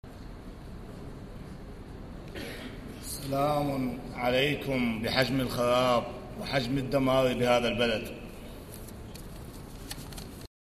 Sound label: loop